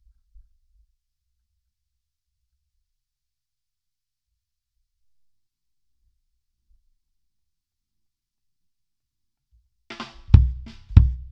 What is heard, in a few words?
Kick Drums